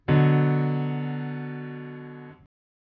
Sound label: Bm lowest, chords